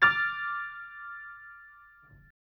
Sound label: Dm highest, chords